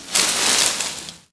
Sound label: leaves